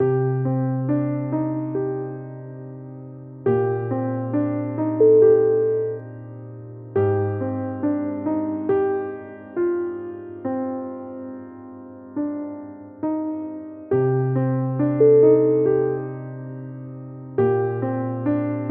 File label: sad and pain